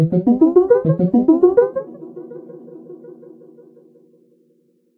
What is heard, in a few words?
Trip Arp; And Yet It Moves demo